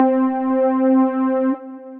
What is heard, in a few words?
Keys, Synth Keys; Talk 2 Me Keys; Ableton; Singularities